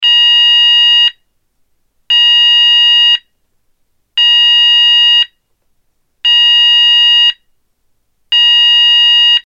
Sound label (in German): Ton